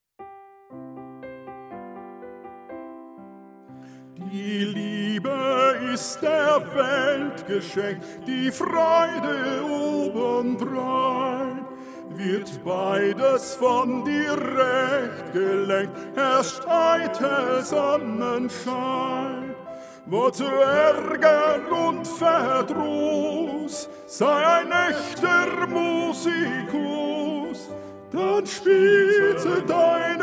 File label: TTBB Tenor1; maenner